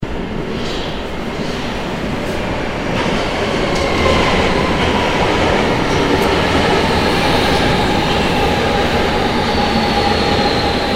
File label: subway arrival